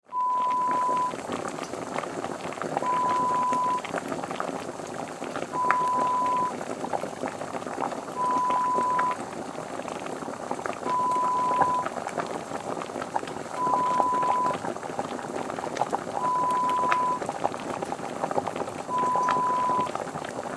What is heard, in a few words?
Lab Ambience sounds